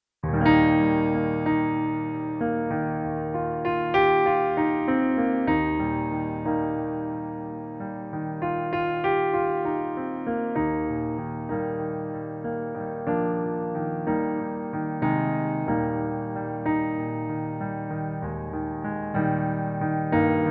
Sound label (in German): Hochzeitsversion Pianoplayback in Eb-Dur